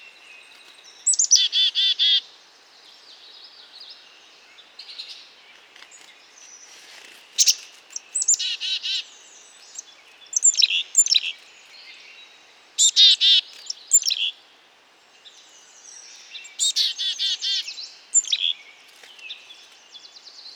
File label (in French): Mesange a tete noire Poecile atricapillus, chants des oiseaux